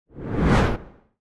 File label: woosh